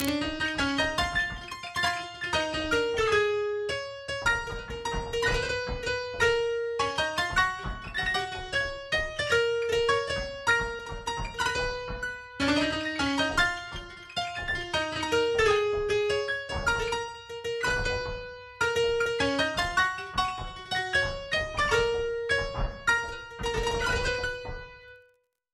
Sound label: Drop Synth DRY; Drop Synth 5 DRY NO SC